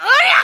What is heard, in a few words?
Throw Heavy; Link throwing a heavy object; zelda